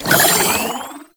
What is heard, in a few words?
Nokko Mushroom Capture Start; Warframe; Nokko Colony; Mushroom Hunt